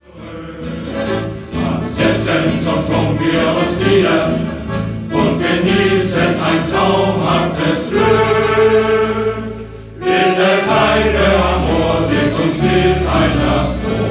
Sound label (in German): Shanty Chor